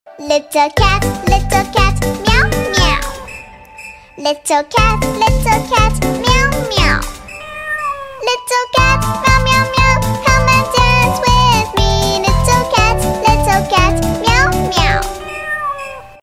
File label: singing used voice